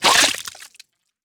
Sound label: video game blood pop; mixkit